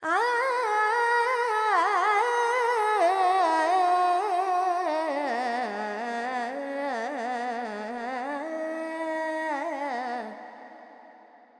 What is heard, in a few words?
FX Dorian, Vocals, Carnatic Female, Alaps, 70 G